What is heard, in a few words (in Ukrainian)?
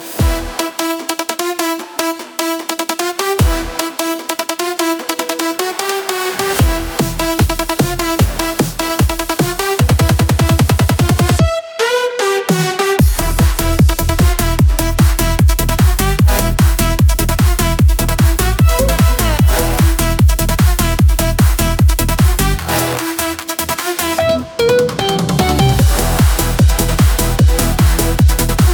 Трек Біт